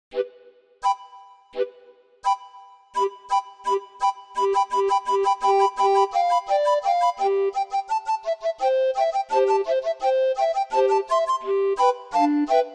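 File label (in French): orgue de barbarie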